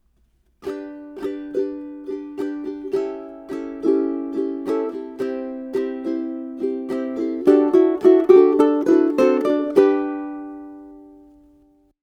Lick, ukulele

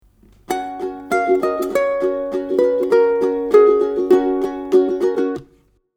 Lick, ukulele

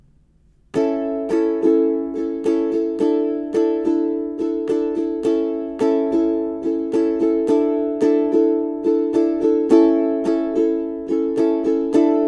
Queen Strum, ukulele

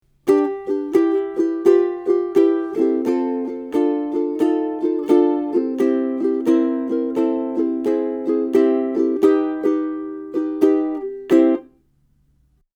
Blues Turn, ukulele

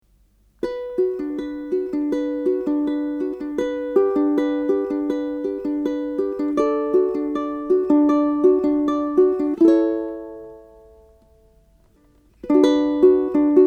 Intro Picking, ukulele

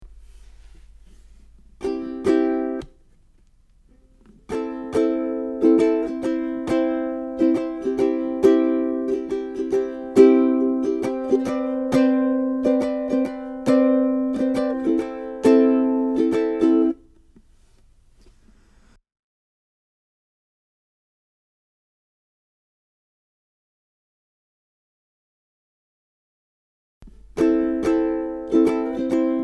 Chords, ukulele

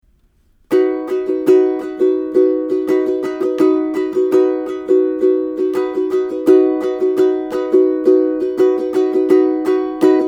Chorus Strum, ukulele

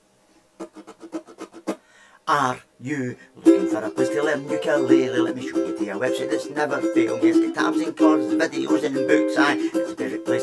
theme tune, ukulele; uke hunt

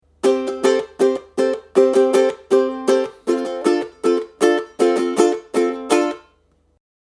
Strum, ukulele

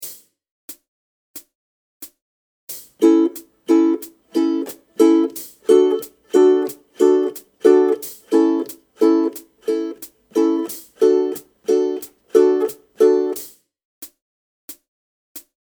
Strum, ukulele

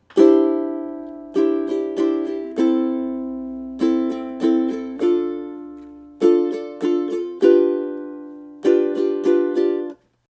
Strum, ukulele

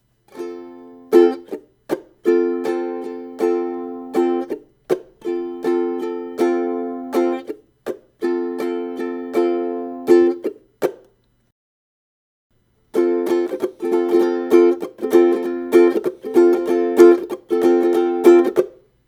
Strum, ukulele

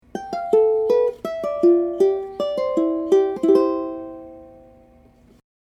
nokia, ukulele

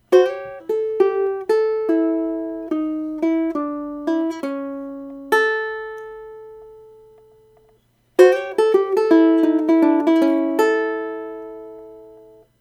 La La Blues Outro

